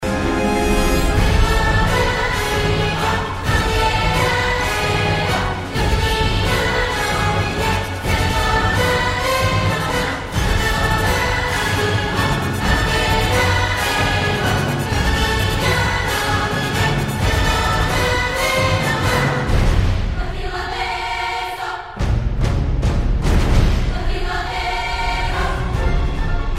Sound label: Solo